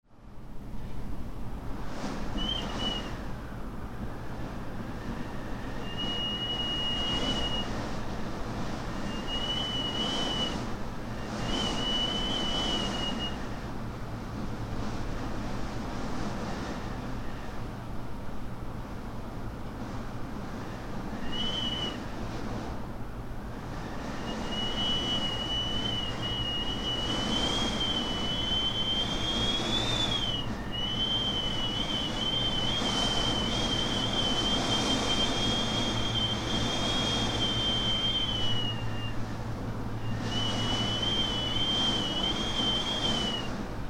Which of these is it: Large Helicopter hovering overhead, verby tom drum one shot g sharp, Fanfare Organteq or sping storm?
sping storm